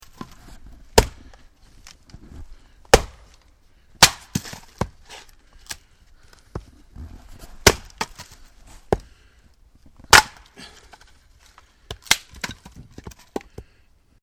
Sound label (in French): casser la glace